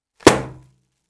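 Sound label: clap sound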